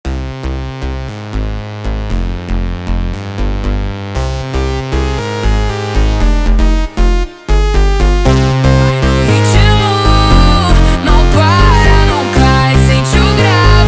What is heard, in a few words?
slowed, funk